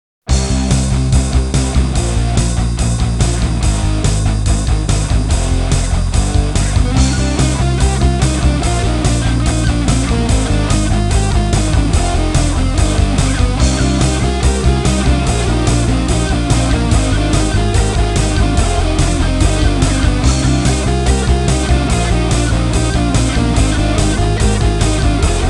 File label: C minor Scale Metal; 古いカセットテープ音源を音楽ファイル変換してみました; 全パート演奏; 自分のギター練習用に作った曲。; 左手四指と右手ピッキングアップダウウンの練習になるので常に弾いてる。; バッキングもリードもリッケンバッカー650S←バッキングはリア、リードはフロント